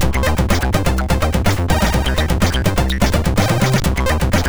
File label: Eating Tune, MOS6581R2; Rokling Henning; Rastertime